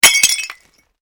GLASS SHARD SMASH

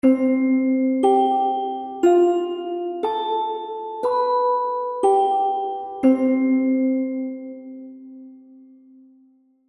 Happy Baby Game Music; Cartoon Music

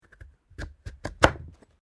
PUMPKIN CUT; Various food preparation; Punch And Hit Sound Effects; Punches and Hits (Sound Effects:Combat)

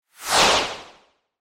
Laser Beam Pass By, Whoosh; Laser Sound Effects; BlastWave

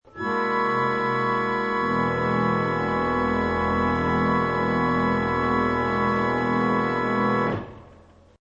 Organ Sustain, Church organist plays long note.; Keyboards

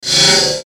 Static Chorus Sweep; Production Element Imaging Element Accent Transition; Blastwave

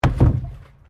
PLASTIC BARRICADE IMPACT

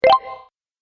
Positive Win Mini Bonus, Video Game Sound Effects

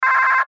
Radio Morse Code; Morse Code Weak Signal Number Five; Morse Code Sound Effects; Blastwave; Warfare

